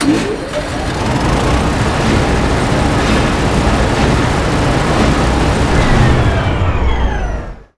tunnel; Tanks

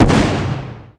exp short; Tanks

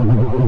phasemissile; Tanks

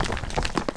rocks; Tanks

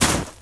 snowball explode; Tanks

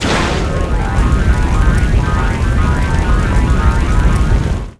burning rubber; Tanks

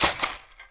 flying discs hit; Tanks

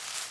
stardust hit; Tanks

